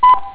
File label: bleep